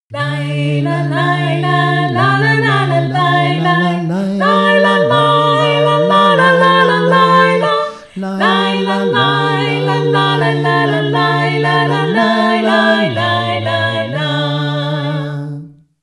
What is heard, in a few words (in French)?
VOIX